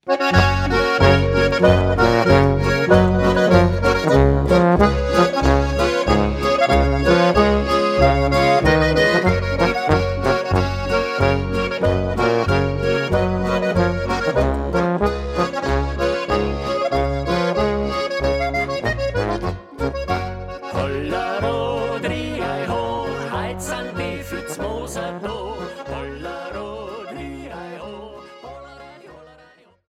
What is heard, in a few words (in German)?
Boarischer